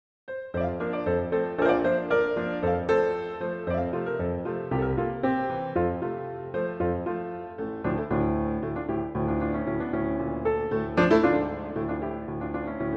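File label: Allegretto, violin; Brahms; Hungarian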